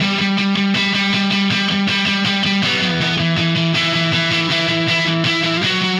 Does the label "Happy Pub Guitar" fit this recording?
Yes